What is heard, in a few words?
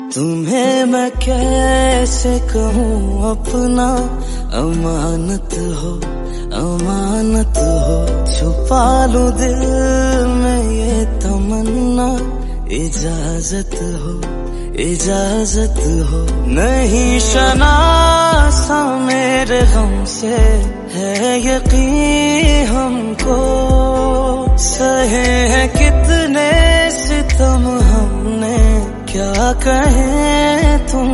ost hindi song; amanat